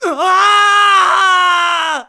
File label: Big Fall; Link screaming during a big fall